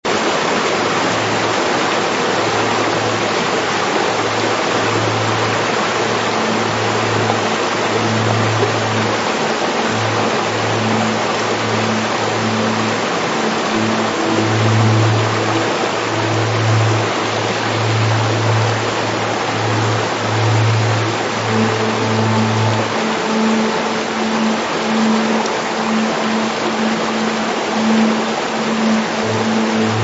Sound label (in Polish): himalajski potok